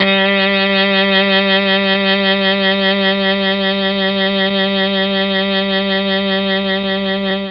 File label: G2 MKII sax, mellotron